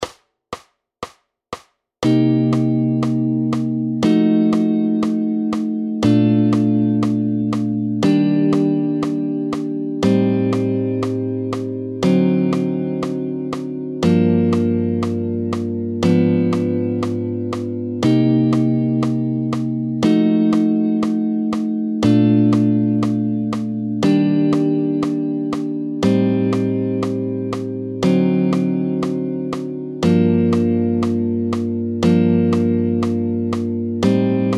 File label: Practice, guitar